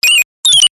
science fiction robot nano bot cute digital chirp beep; zapsplat